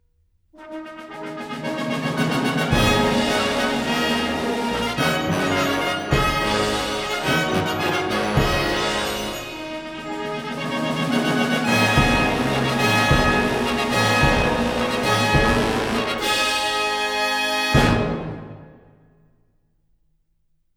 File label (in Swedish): Jubileumsfanfar; flygvapenmusik